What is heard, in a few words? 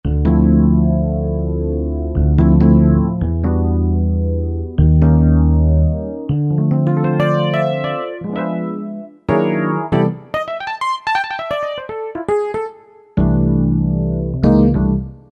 Funky EP, Yamaha DJX; Voices